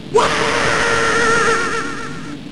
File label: scream; Robinson's Requiem